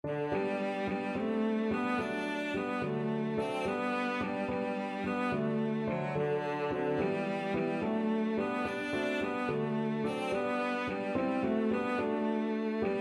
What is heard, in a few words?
three ships, cello